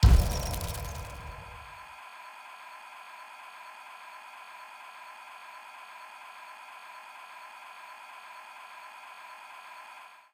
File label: spin Button; dracula